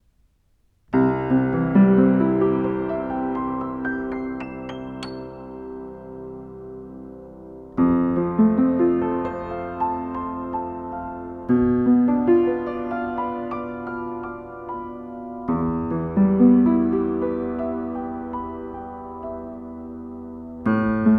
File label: Piano Mics Inside Openlid; AKG C417PP